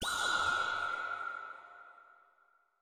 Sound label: chime; chime sharp